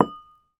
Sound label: bottle vinegar down on surface; household; sound effects; sound effects one